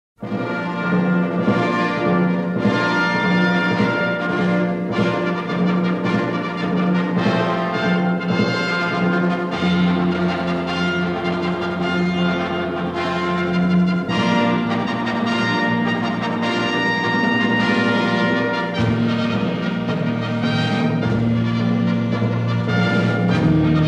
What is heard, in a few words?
Fanfare